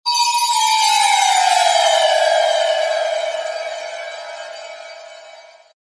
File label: magic spell dissolve; fantasy